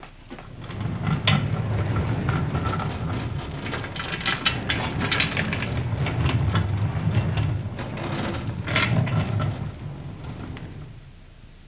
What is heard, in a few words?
Gurney Rolling Long